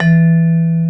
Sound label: VIBES E2; E-MU Formula 4000 Series Vol. 5; Protozoa; Proteus1 Instrmt